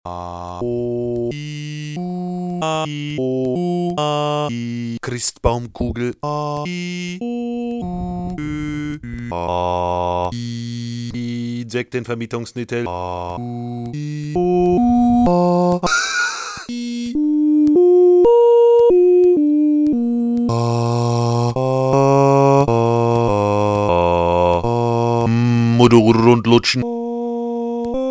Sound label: error